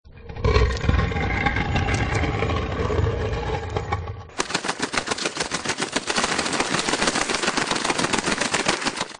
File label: Pirates Cave Lever